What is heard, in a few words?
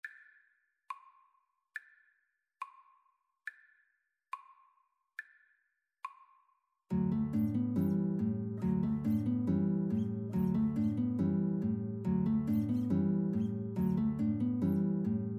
Guitar Trio